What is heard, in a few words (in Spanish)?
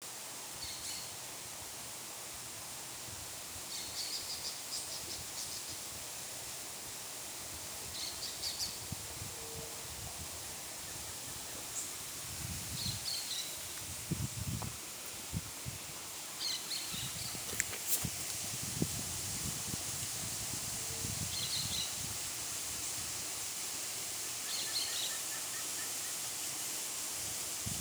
Quebrada